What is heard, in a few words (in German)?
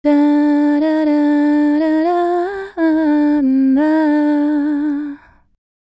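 Female Vocal 2 x komprimiert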